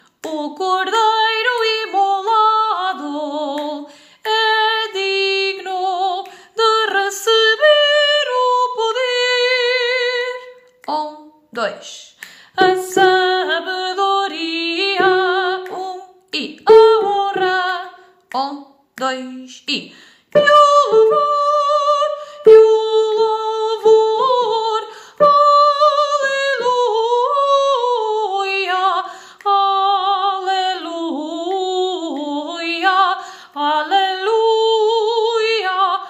Entrada Refrao Alto

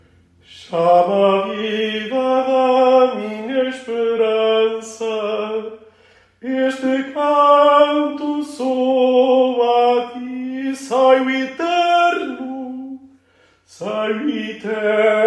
Hino Jubileu refrão Tenor

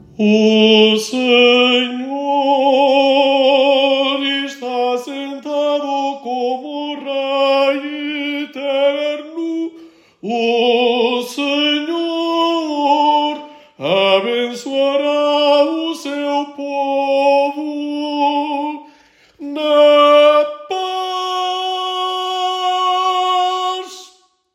Comunhao refrao Tenor

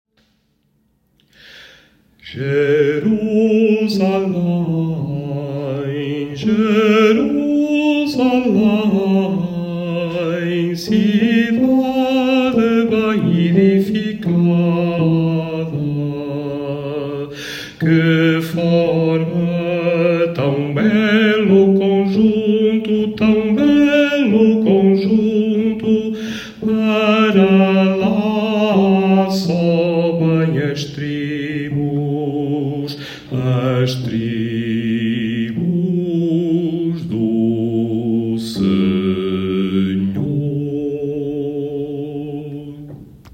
Entrada estrofe Baixo

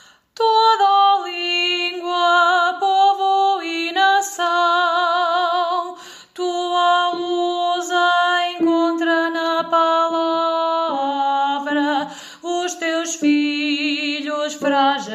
Hino Jubileu Estrofe Alto

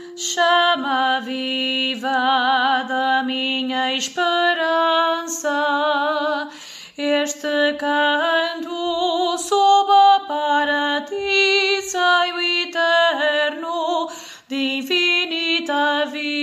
Hino refrao Alto; Jubileu

